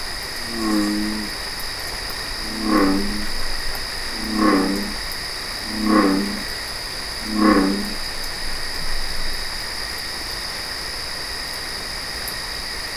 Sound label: bullfrog